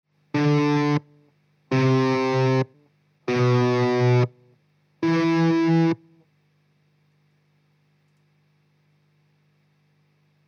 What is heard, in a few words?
REVV Gseries Release Times